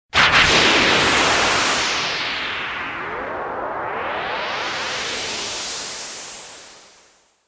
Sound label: Zap Slide